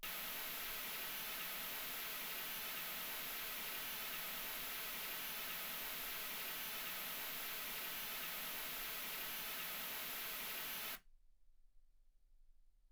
mls 48kHz

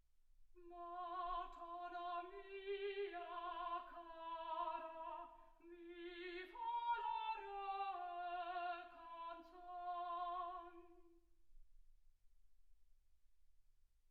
soprano

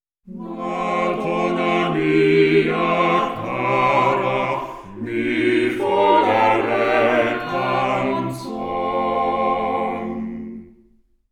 quartet

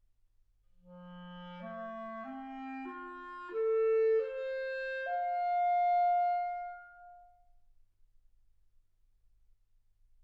clarinet

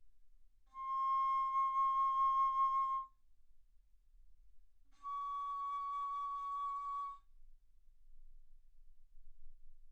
Bass Flute C6 Db6; ff

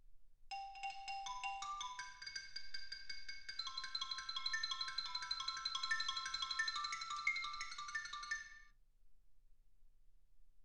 xylophone

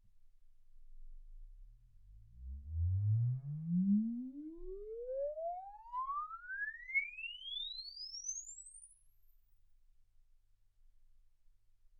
exp swept sinus 10Hz 24kHz

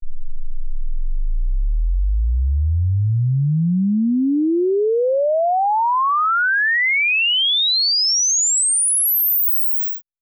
exp swept sinus 10Hz 24kHz; OmniPower4296

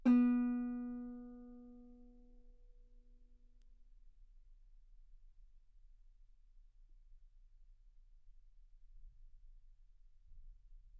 Guitar B3; ff; sul B

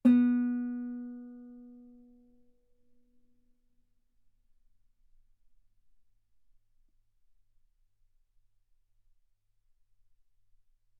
Guitar ff sul B B3

